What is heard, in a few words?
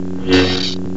Hum clash; WT588D; Arduino; SabrSound2